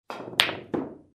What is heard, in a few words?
hit correct